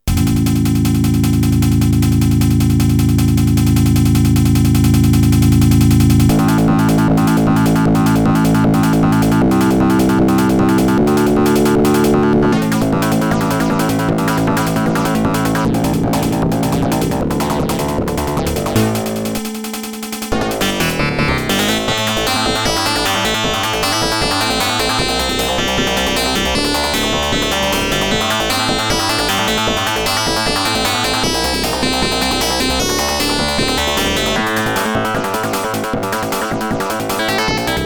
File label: Genius Sound Maker 3DX2, fm compare; crystal-cx4235